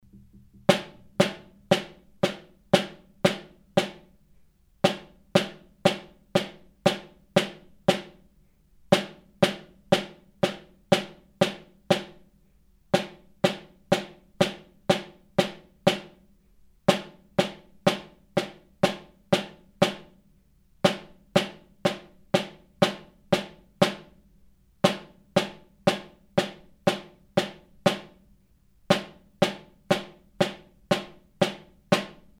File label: snare